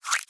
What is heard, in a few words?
Card Get; Mighty Party